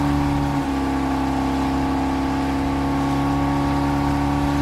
Boat Loop

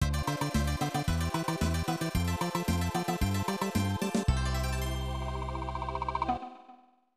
Puzzle Correct Answer; Itou Hatasu Rokudan no Shougi Dojo; SNES

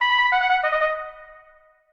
Whisper

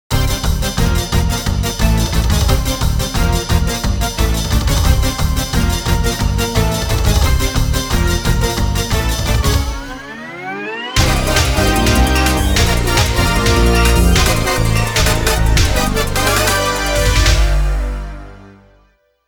Stage Start; Mega Man X3; Saturn; Tunnel Rhino

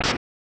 Swoosh; bishoujo senshi sailor moon s juugai rantou shuyaku soudatsusen snes

